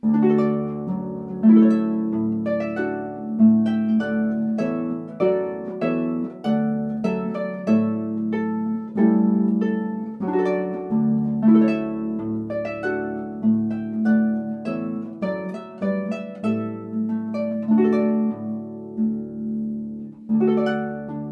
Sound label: TrumpetVoluntary